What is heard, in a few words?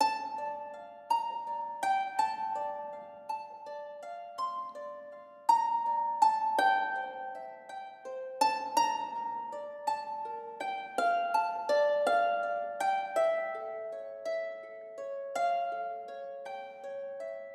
needolin bell beast; Hollow Knight: Silksong